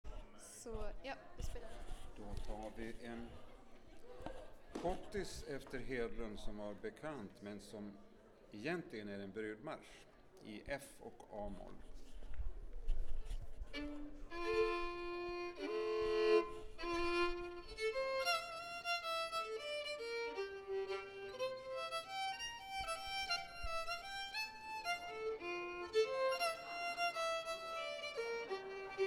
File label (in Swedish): Schottis brudmarsch; efter Hedlund